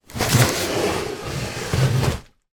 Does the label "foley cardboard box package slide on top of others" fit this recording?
Yes